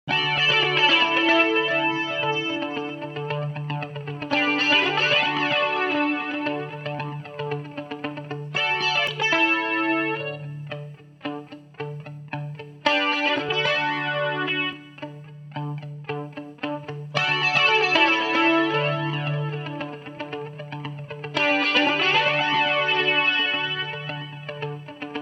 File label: Verse Chords with and without Delay